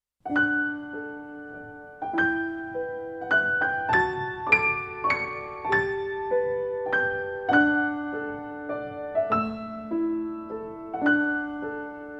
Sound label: How Are You My Silver Dolphins (piano solo)